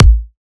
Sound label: steel kick drum sample a key; kick drum samples